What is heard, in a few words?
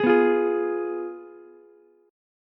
F Diminished Ukulele; 13,11,13,11; Fdim strummed; JGuitar